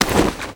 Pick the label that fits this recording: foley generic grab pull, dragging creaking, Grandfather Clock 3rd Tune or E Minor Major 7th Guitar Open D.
foley generic grab pull